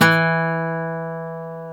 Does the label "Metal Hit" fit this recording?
No